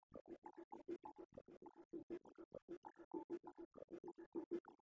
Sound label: Bongo